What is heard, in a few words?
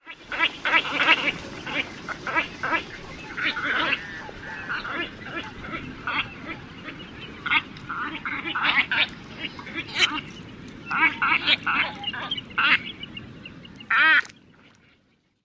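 nasal quacks, White-faced Ibis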